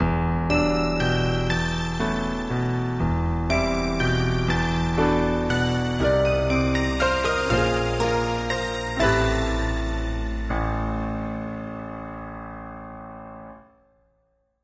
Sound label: Gameover; Dungeon SS2022